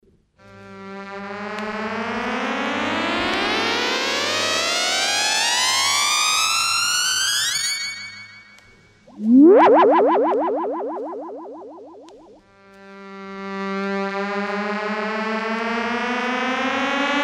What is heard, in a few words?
ascend + descend, Electronic Sound Patterns; His Master's Voice